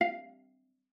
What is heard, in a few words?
VIZP F5, VIOLA PIZZ; Xsample VOL-5